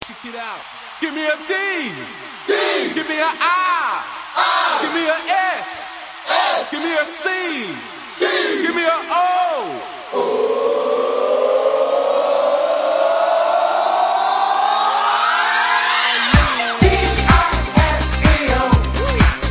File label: D.I.S.C.O.; trance